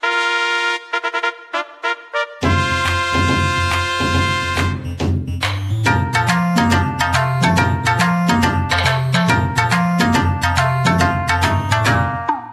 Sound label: Jingle Rpg Map